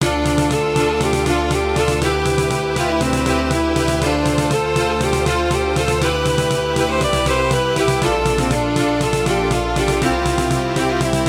ending, phone; haunted treasure

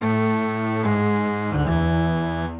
level failed, phone; haunted treasure

